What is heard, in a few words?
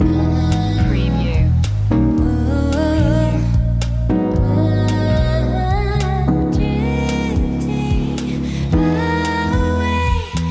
drifting away loop